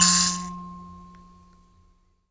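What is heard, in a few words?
high kalimba F 3; Heart of Africa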